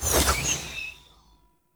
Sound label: Rain Rune Projectile Whizz; Powers; Runes